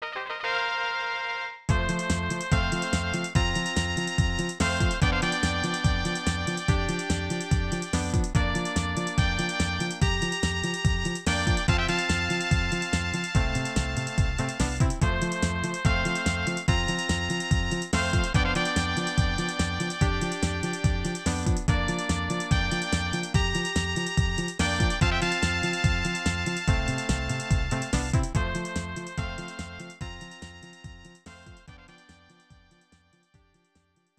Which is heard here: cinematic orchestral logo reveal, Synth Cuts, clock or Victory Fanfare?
Victory Fanfare